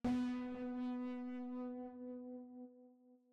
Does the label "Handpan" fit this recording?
No